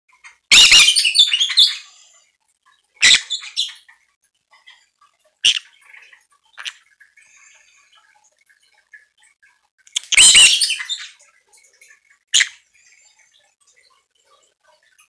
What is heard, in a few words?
Green cheeked parakeet; طوطی گرینچیک